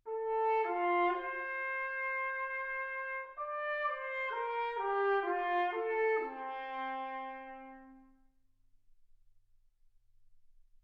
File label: trumpet